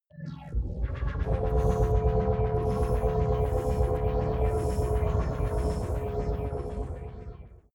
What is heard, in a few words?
generator smooth start; comedy-cartoon; elektronisch